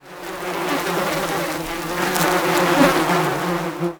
bees; Counter Strike Model Pack; CS:GO; inferno

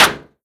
flavor metal, bullet impacts; physics; Half Life Model Pack

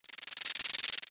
rattle warning layer, headcrab

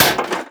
bullet metal grate; physics

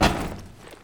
metal grate, footsteps

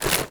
zombie move short, foley; creatures; Half Life Model Pack

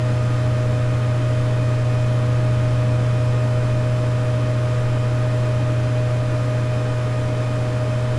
tech room, office; Counter Strike Model Pack; CS:GO

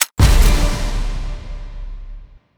case awarded legendary

